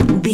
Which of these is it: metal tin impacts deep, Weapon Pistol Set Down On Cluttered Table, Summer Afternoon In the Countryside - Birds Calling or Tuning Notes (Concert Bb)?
metal tin impacts deep